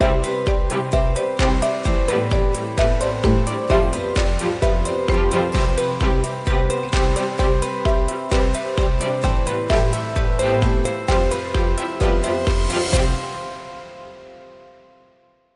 Corporate Achievement

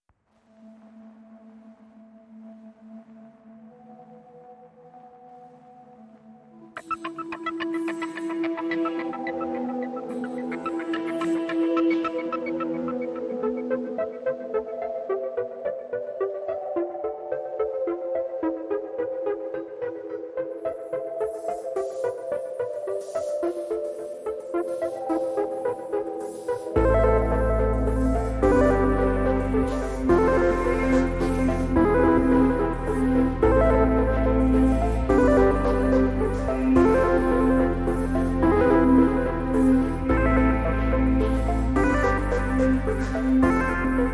Bleepy Carol Of The Bells

